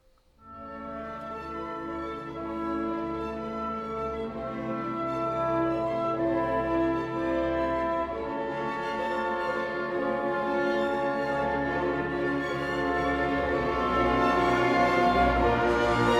4th Movement Development Climax Between Bassoon Interjections; Bruckner 9th; Rattle